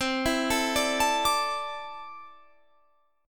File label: D Suspended 2nd C; D2/C arpeggiated